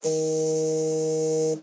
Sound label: Vibration; blue archive